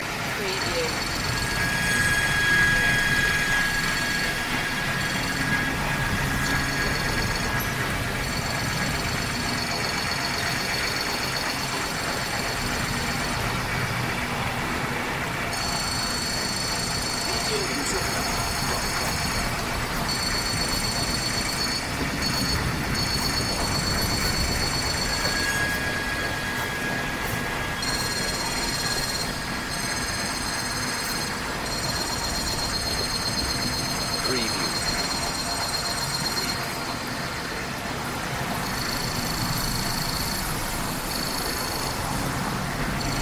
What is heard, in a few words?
starship machine room loop